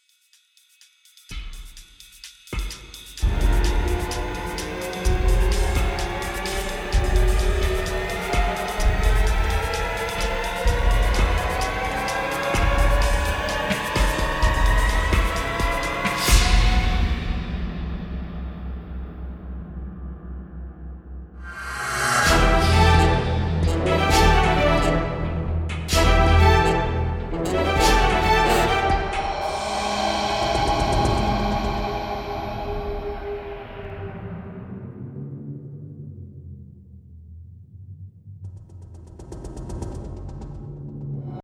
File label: Drama Action Production Music